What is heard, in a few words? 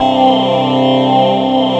FORMANT C3; Q Up Arts; Dream Experience; DANCE